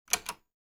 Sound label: switch